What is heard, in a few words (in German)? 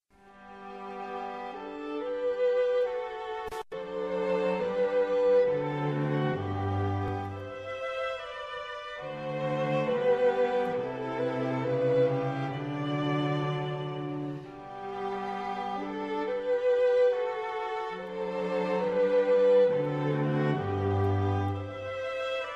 Streichquartett Nr. 77 Kaiserquartett 2. Satz; Haydn